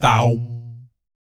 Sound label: BS, Vocal BASS FX